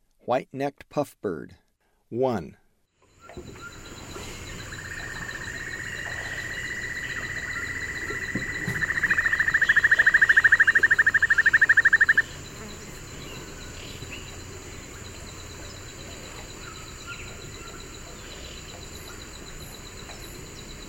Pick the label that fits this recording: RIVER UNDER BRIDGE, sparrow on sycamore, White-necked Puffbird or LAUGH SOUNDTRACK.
White-necked Puffbird